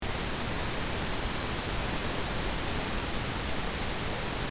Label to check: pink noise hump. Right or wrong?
Right